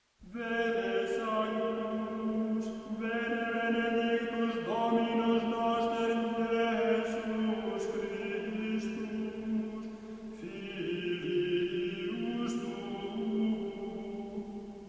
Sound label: Altspanische Gesänge - Post Sanctus Vere sanctus, vere, Gregorian chant; Abadía de Santo Domingo de Silos